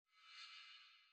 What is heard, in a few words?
Echolocation Platform; Cave SFX